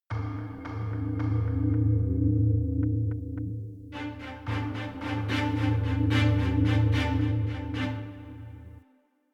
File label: Monster Hunting Intro; Skellige; Monster Hunting 03-0 Intro